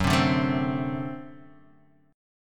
D 7th F sharp, guitar

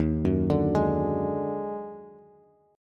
D sharp Minor Major 11th, Bass, Bass Standard; D#mM11 arpeggiated; 11,9,12,13

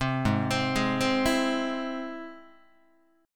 Ab Augmented Ab Guitar; Ab+ arpeggiated; JGuitar

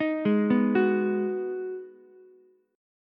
Ab Minor 7th down Ukulele; arpeggiated; JGuitar

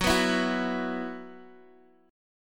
E Suspended 2nd Gb

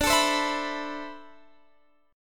D 13th Suspended 2nd D

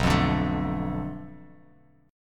B Minor 7th Flat 5th B Bass Standard, chord; Bm7b5; strummed; JGuitar

